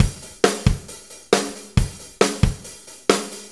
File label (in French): Rock tempo rapide